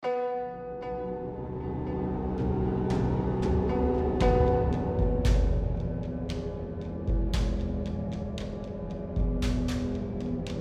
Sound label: Tension Block; The Last of Us